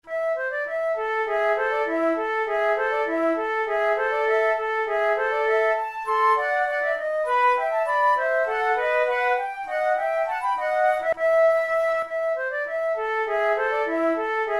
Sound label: allemande, flute